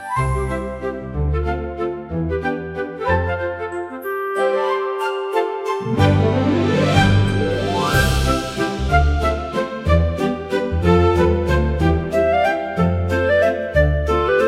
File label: fantasy music cute bright